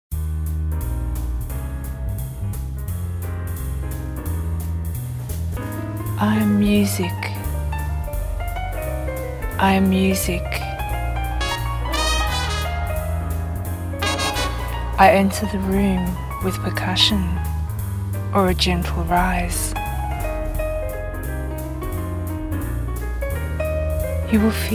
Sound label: I am Music (Jazz Version)